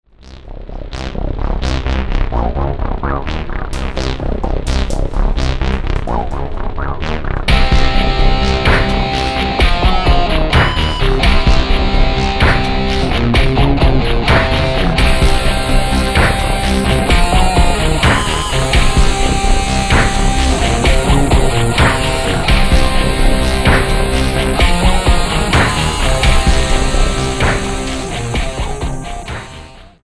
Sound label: Horror